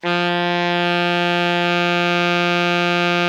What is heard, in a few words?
ALTO MF F 2, saxophones; Greytsounds - Philip Wolfe David Crozier's Saxophones; ALTO SWITCH